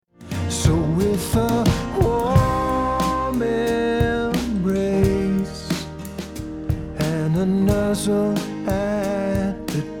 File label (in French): mixage sans tape